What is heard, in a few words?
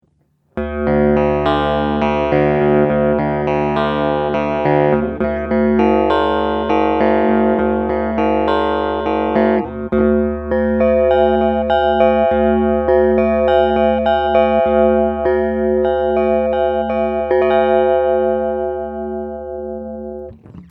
Internal Osc X Guitar, ring modulator; analog synth